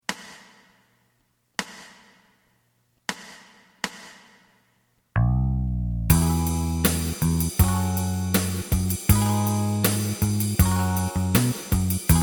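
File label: lead guitar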